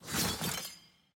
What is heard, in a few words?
lobby build in grandmaster; regalia